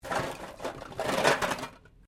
Can Softdrink Rubbish Move; various metal cans; Materials

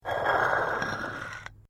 PAVING STONE MOVE, dragging

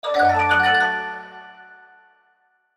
Musical Mallet Ascend Reverb Wash; Mallets; Blastwave; Sound Effects:Musical

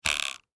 Cell Antenna, Cell Telephone Flick Antenna; Technology; Cell Phones; Electronics; Blastwave

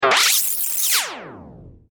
Rewind Short Tape; Production Element; Tech Sound Effects; Tech (Sound Effects:Sound Design)

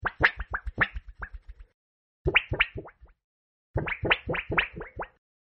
High Pitched Cartoony Wobbling Effect-3 Takes; Cartoony Effect Wobble High; Cartoon Sound Design Sound Effects

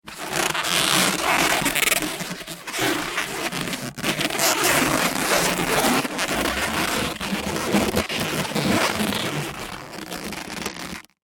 Foley Balloon Animal Twist Shape; Balloons; Cartoons

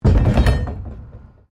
PLASTIC BARRICADE MOVE; Material components for constructing more complex sounds

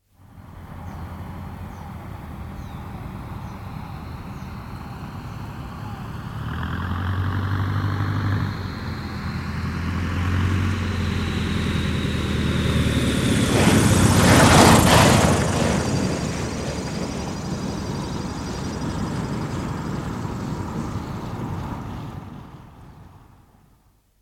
Beagle Pup, exterior recordings Land; Part of Dogs (Sound Effects:Animals); BBC

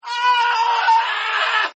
Scream Male; Screaming; Sound Effects:Voice; Partners In Rhyme

